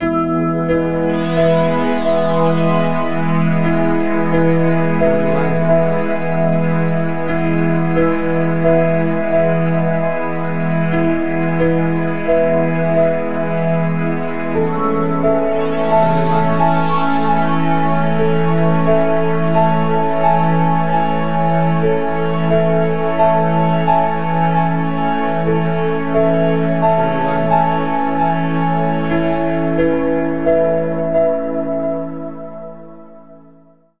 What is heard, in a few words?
Ambient Dream